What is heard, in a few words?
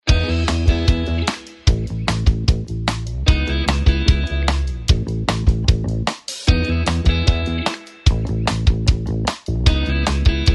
rock pop